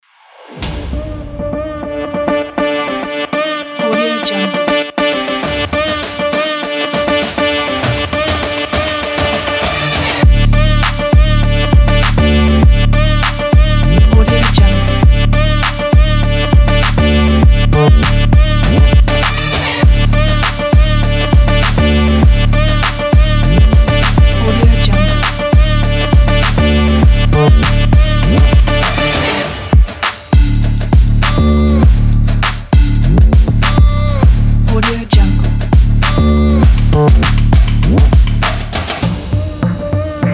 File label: trap beat